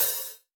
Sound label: Hat Open; osu